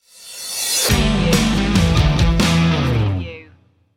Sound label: rolling free stinger